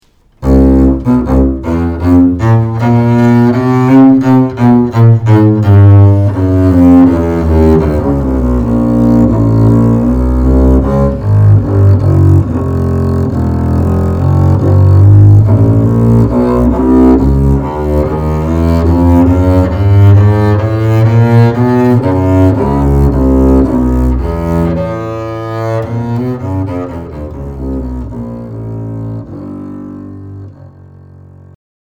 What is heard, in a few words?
antique double bass sound sample; blonde double bass